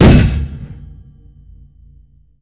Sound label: Body Falls on a metal surface